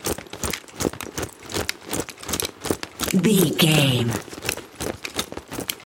foley soldier run walk gear equipment rattle movement loop